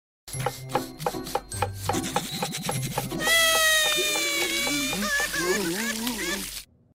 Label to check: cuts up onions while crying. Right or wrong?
Right